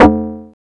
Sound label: ELEC; Optical Media International; Sonic Images Library; SI FX Vol 19